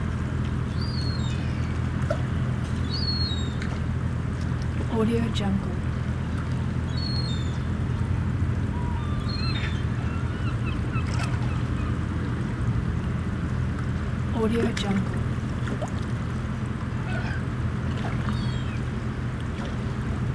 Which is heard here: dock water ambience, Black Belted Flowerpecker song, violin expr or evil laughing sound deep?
dock water ambience